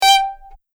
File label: Violin Pitched